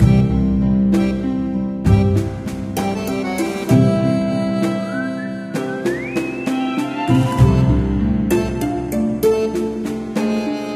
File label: Candy Crush Loop; candy crush saga gamerip